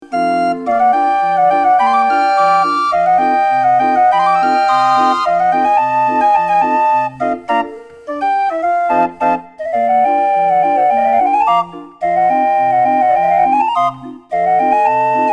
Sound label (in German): 31er konzertorgel